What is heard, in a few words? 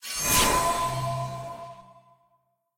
uikit consolation stinger; postgame